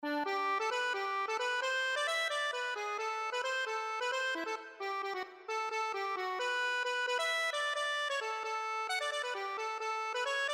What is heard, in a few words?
accordion